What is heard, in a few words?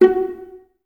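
Violas F#4 pizzicato, String Ensembles; Miroslav Vitous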